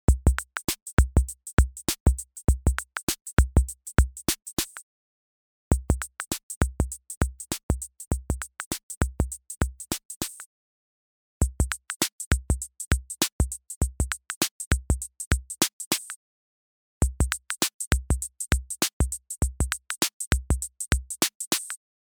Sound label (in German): cr78 loop roh; ssl uc1